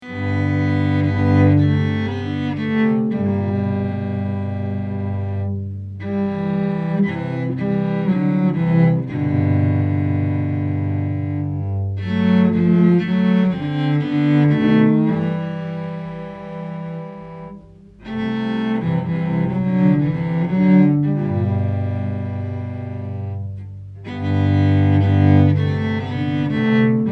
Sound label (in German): Cello; Leise riesel